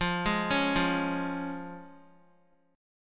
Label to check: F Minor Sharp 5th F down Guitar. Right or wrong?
Right